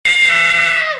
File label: screaming